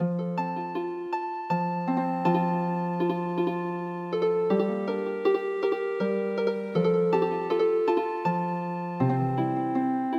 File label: Healing Harp, traditional